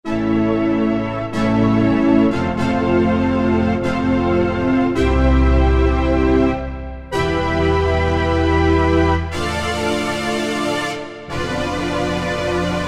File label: BR BrasOrc, Yamaha TG77; Factory Presets